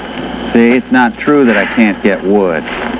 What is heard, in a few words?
wood; See, it's not true that I can't get wood!